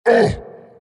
abys pain; abyssal underlord

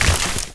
treant move; footsteps; furion

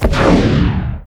attack, weapons; warlock

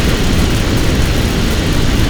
lifedrain loop; weapons; dota; pugna

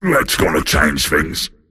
death; dota; pudge

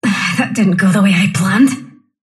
sylph death; dota; dark willow

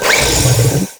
drunken haze cast; weapons; dota; brewmaster

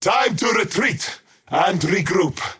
crown teleport pain; skywrath mage

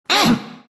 broo pain; broodmother

